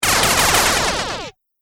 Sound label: Science Fiction Laser Rampant Shots, laser gun; Sci-Fi Laser Sound Effect; Andrew Fleming